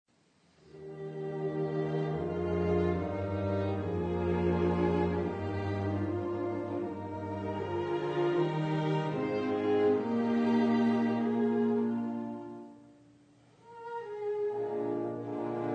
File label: Viola Quintet in g, Adagio ma non troppo